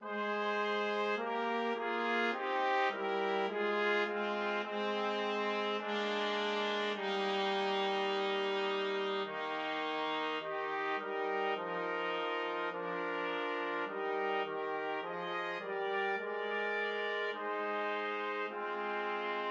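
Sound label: trio trumpet trumpet trombone; O Perfect Love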